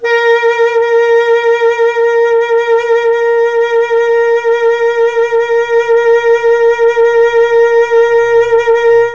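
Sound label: A#3 Tenor Sax, Mellotron; CHMB TNR SAX; Mike Pinder - Mellotron Archive CD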